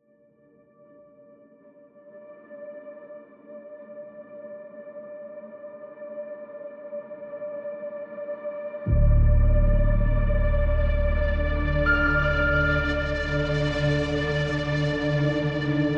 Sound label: tones